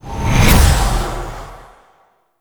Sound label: Zenurik Wellspring Cast; Warframe; Focus